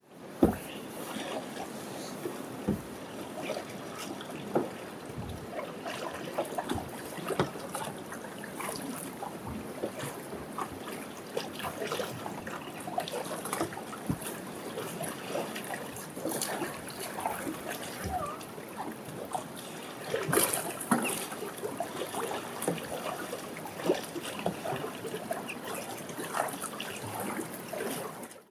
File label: Kayaking over Aqueduct